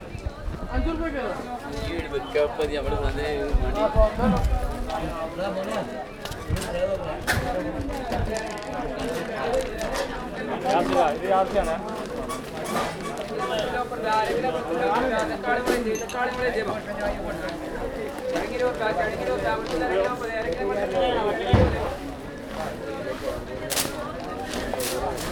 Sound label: market chants kannur, South India street recordings